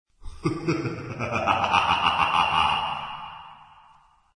risa aterradora

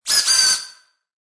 robot movimiento

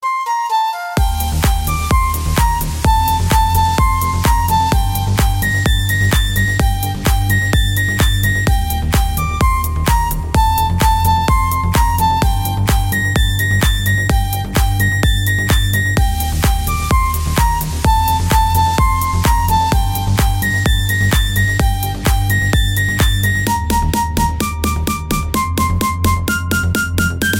Flauta, tono de llamada

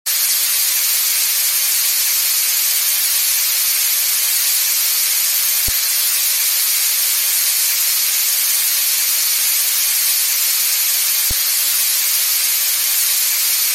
Sonido Blanco

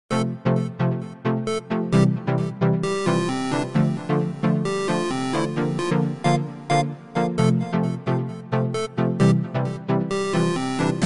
Samsung Galaxy A5, tonos de llamada

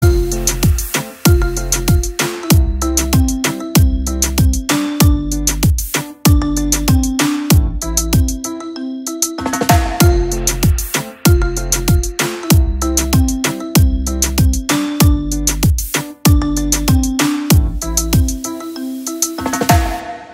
Marimba Remix

